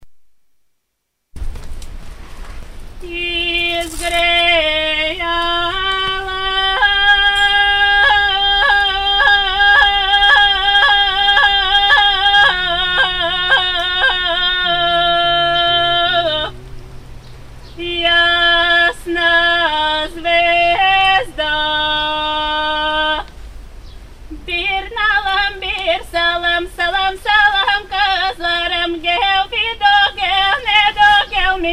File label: bulgarian sops